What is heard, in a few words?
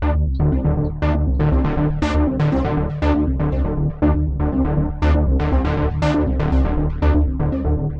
mellow fatsynth arpeggio, synth and electronic loops